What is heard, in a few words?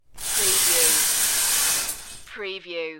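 Shower Curtain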